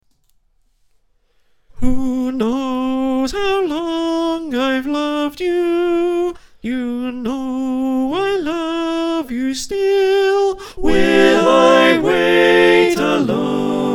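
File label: soprano alto tenor bass, choir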